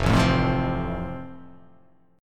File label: F Major, F Chord; strummed